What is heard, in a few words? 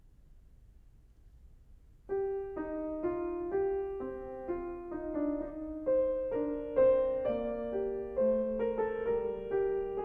Prelude And Fugue No 2 In C Minor BWV 871 Fuga, Clavier; Johann Sebastian Bach; Well-Tempered Clavier